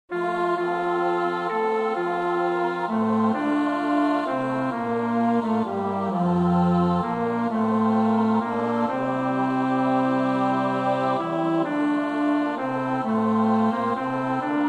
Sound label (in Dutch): bas, koorpartij oefening; Reger (Max); 7 geistliche Volkslieder